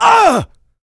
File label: pain large, player; DoomRTX; big